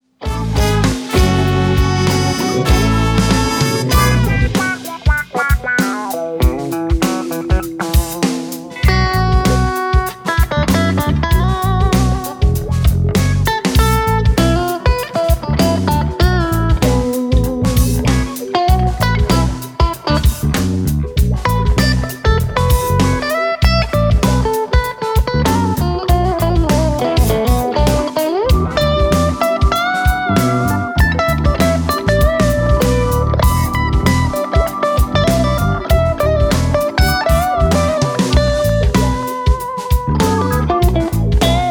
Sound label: Liquid Funk, instrumental